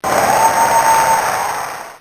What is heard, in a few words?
win